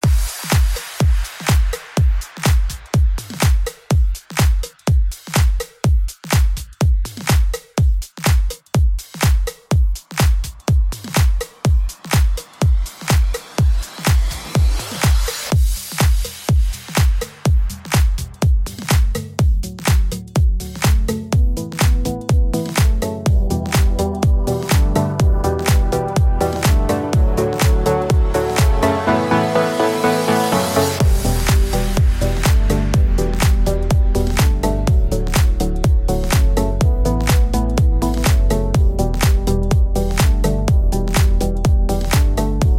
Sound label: mouse house